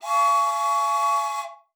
ZUGPFEI; Xsample VOL-9

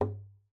KLOPF, GUITAR FX; Xsample VOL-2

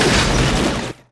SCHUSS; Best Service; Advanced Mega Effects

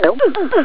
schluck; Amiga Soundtracker sample

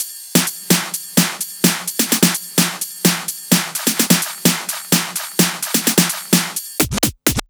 Drum Loop 128BPM FULL ohne BD

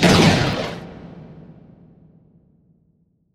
RAKETEN F; Best Service; Advanced Mega Effects

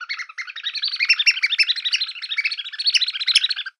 VOGEL, PERCUSSION; Best Service ProSamples vol.42

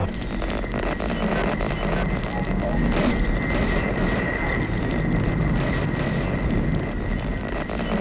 FUNK GER; Best Service; Advanced Mega Effects

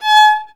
GEIGE, Alpine Volksmusik

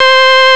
super orgel; Amiga Soundtracker Sample Packs

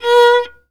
GEIGE; Best Service; Alpine Volksmusik

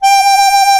MUNDHARMO VIB; Alpine Volksmusik

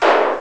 donner; populous; Amiga Soundtracker Sample Packs

